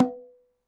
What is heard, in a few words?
bongo, latin hits n licks